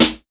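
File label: snare, drum kits; InVision Interactive; 40 Oz Phat Slammin Hip Hop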